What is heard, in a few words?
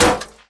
metal bullet hit